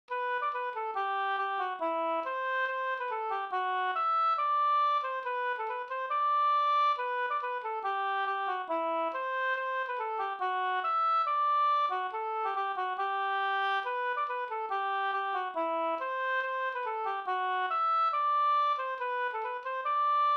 schottis; Skaftingeschottis

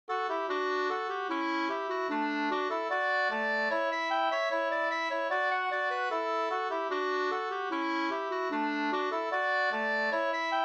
reinlender Rhenlandare; e Per Munkberg SvL

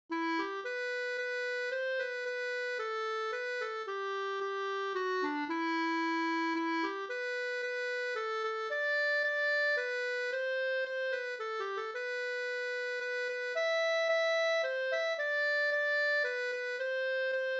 vismelodi, E moll; Visa fran Rattvik

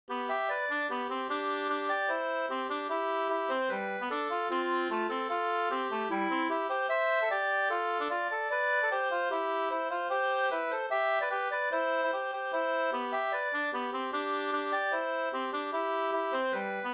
schottis 4-4

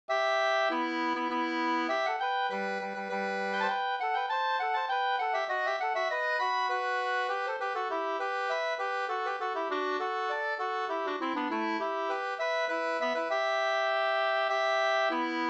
slangpolska

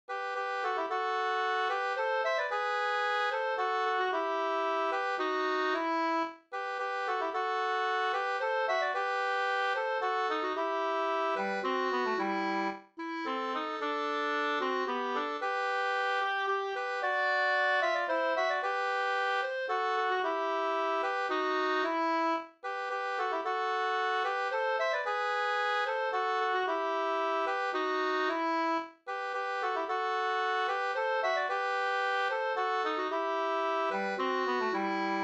vismelodi; Jag gick mig ut en aftonstund

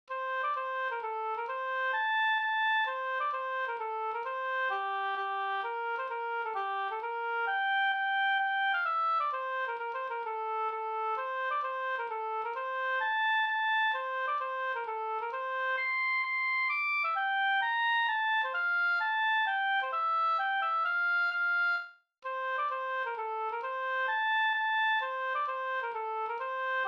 mazurka; e Lindberg e Axel Wester V Vingaker OA044

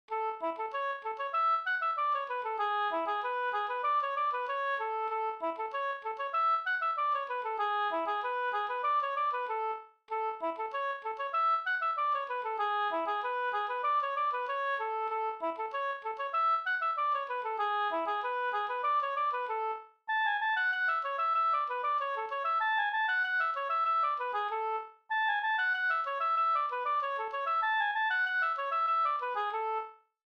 anglais

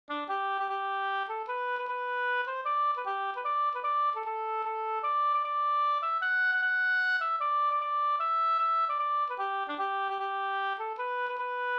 hambopolkett; e G Gustafsson Bjornlunda Sd

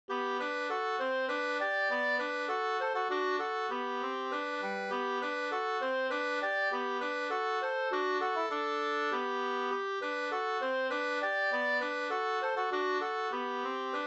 folkdans Sanglek; Ranka ranka Sm

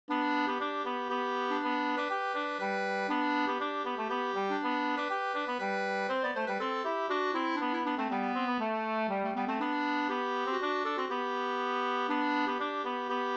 polska Skankpolska till brollopsbanken

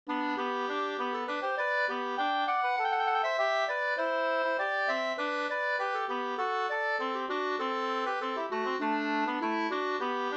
snoa; Delsbo Hs

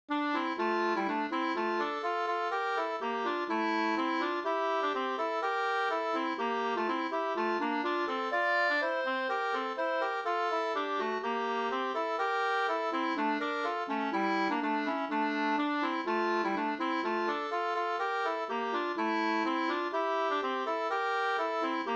humppa; tillägnad Klint-Olle, komponerad av Karin Hjelm, Hälsingland

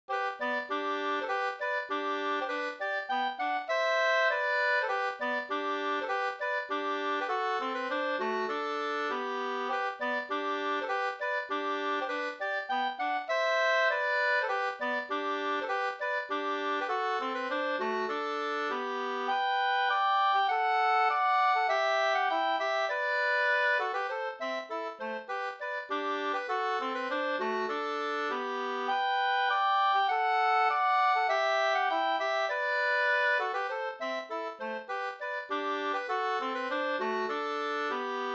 folkdans; Rama fr Hogdal-Lommeland Bh 2st

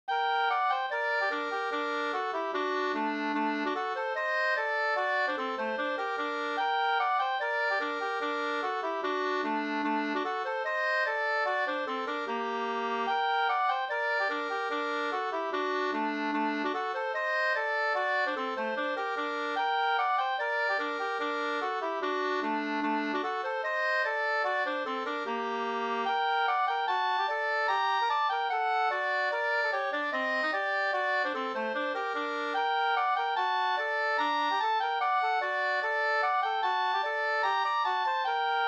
schottis; V Hedlund; Klockarnaset Enviken

